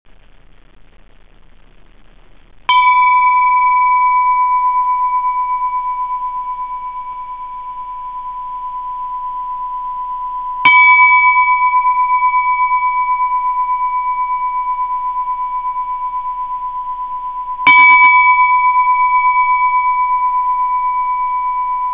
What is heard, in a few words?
Meditative Bells